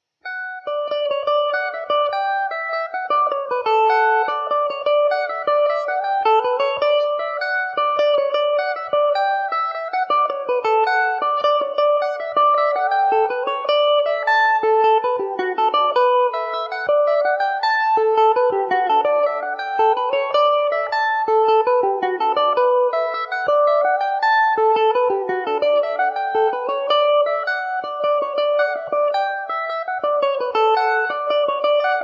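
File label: paddy o snap D major, irish mandolin; Mandobird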